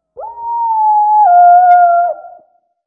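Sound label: animal wolf distant; amb elements; modern warfare 2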